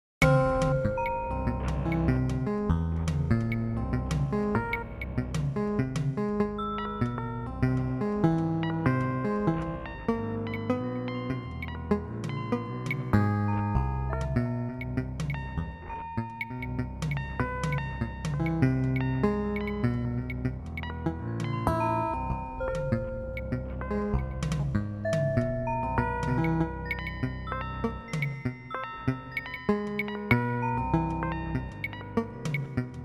a little light jazz

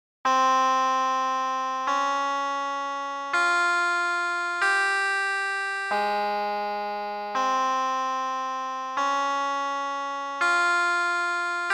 sitar tune; fractal music

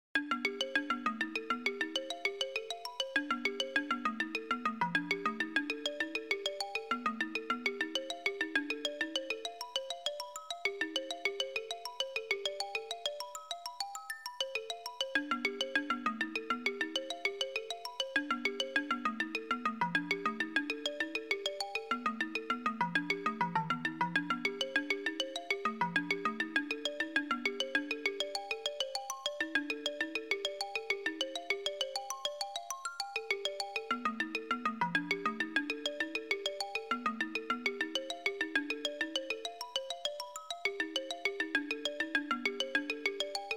african xylophone; fractal music

